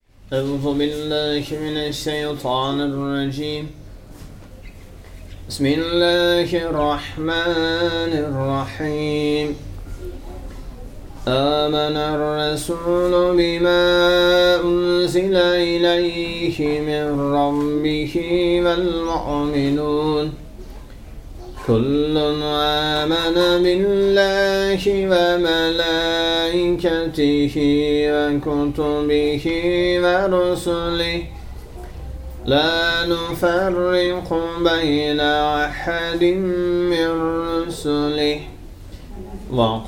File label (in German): Bukhara Gebet; Bakhautdin